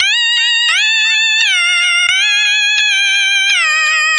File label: 173 3 4 organ melody; KEYS ORGAN PIANO LOOPS